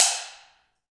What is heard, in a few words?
Shell Dance; Roland LCDP14 Africa VOL-2